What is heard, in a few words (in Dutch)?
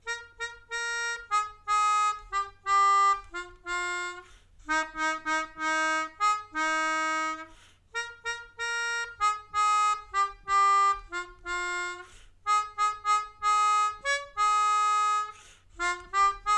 Melodica